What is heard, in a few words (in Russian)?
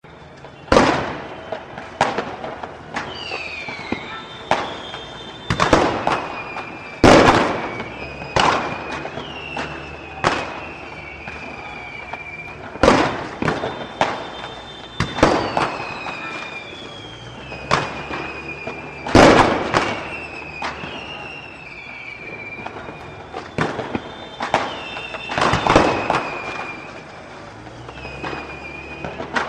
fejerverki i salyuti v gorode